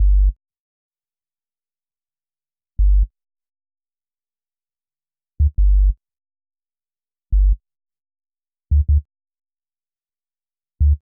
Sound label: Old Shizzle, Hip-Hop, 86